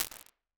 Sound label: Dialoge, Convolution Reverb, Ambience and Small Rooms; Ableton